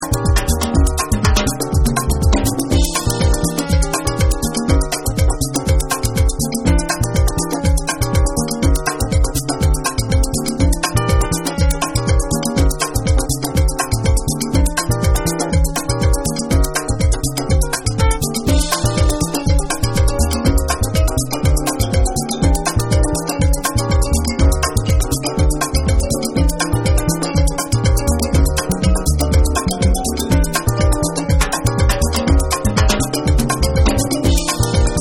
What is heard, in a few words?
Latin Dub